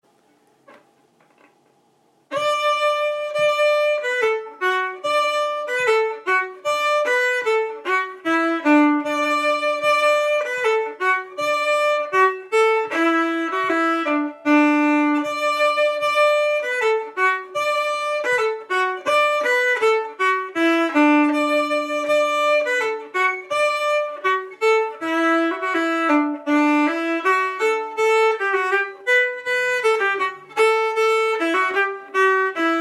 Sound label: Polka slow; Bill Sullivans Polka